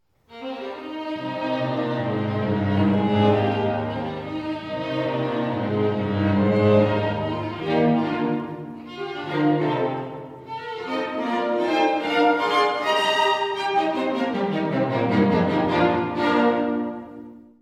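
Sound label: string quartet; Es-dur; 生き生き; メンデルスゾーン自身が最高傑作と評した弦楽四重奏曲。; 速いなかに暖かさも感じられるのが素敵。; 弦四5番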